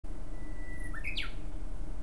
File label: bush warbler